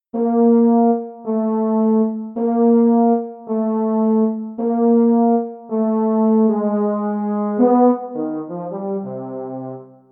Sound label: baritone; holiday brass